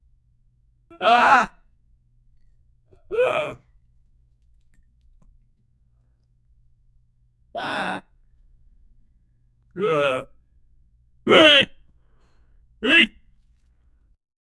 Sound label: man surprise sound